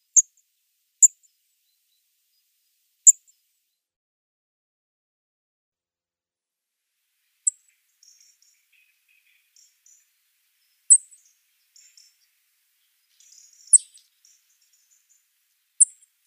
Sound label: Song Thrush calls